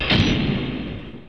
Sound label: KLUNK; NIHILIST